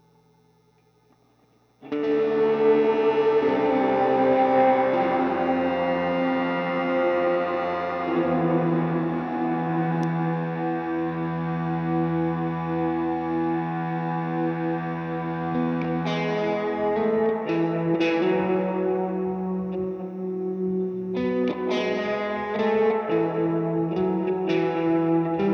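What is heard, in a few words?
SM57 Guitar, mono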